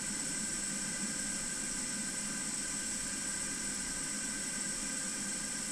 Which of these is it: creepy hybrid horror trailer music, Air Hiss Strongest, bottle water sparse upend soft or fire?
Air Hiss Strongest